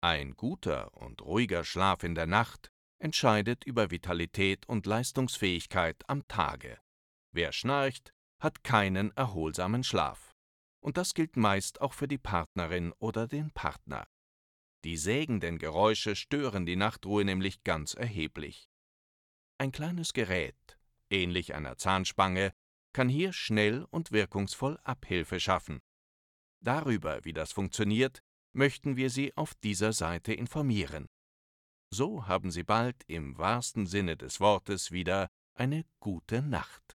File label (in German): schnarchen